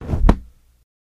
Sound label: Cloth Drop Soft Hit